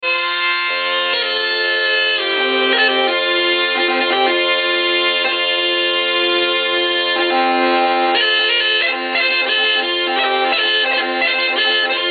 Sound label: bagpipes podpolanie